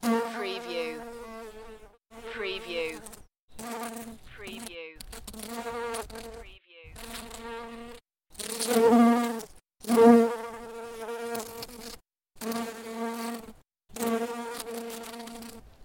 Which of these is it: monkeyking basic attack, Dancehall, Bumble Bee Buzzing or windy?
Bumble Bee Buzzing